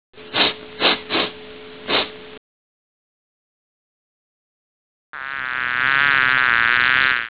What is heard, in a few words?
sniff bee